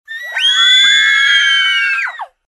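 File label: child's fright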